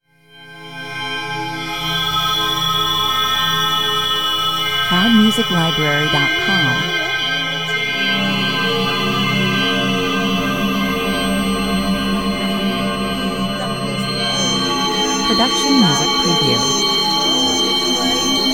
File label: Eerie, haunting and frightening. Pure sound collage about dark secrets, 112 bpm